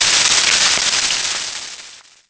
flesh burn; weapons; Soldier Of Fortune II Double Helix; XBOX

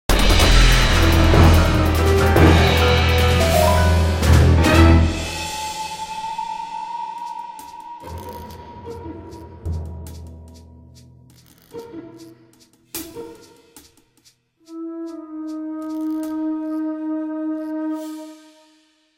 Fight Win; music; Silas; The Da Vinci Code; XBOX

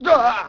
bail vox; Tony Hawk's Project 8; XBOX; bails

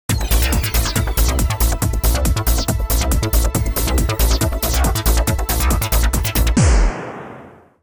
grade; Pump It Up Exceed

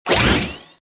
pickup energy, player

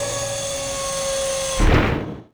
ramp drop; Matt Hoffman's Pro BMX 2; XBOX

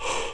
ranger pant in

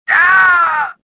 pain; Star Wars Jedi Knight II Jedi Outcast; XBOX

